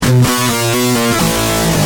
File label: G Heavy, Guitar; Industrial